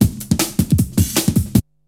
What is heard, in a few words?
BREAKBEATS, TECHNO